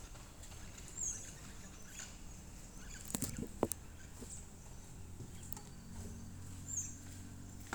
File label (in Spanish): Loro maitaca